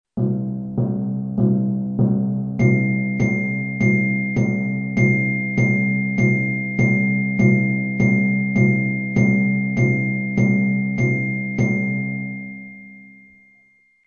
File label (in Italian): contrattempo